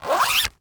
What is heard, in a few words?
Sports Racquet Tennis Racquet Cover Zip Up